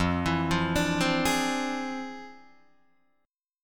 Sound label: F Minor Major 13th, Guitar, DADGAD